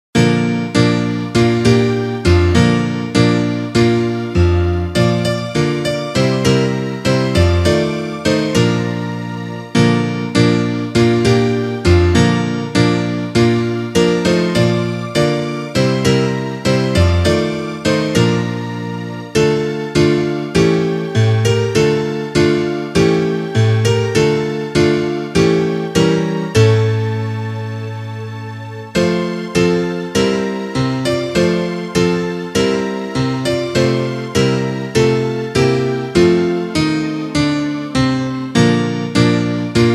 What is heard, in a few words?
instrumental